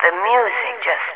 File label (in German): musik